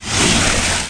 fx puddle

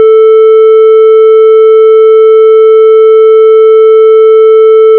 Sine 440 clip; Coverstory

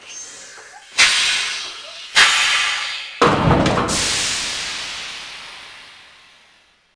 cab stop; Shadow Man

